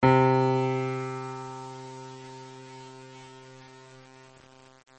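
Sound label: piano single C2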